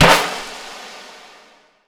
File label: ocean drum